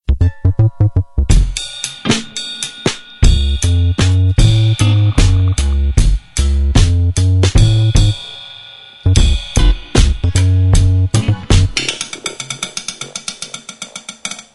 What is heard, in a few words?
Crumpled Glitched